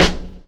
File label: warm snare single hit c sharp key